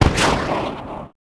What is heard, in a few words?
Rocket Shoot; Sid Meier's Civilization 4 Complete; Warlords; Units